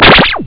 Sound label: Jet Fire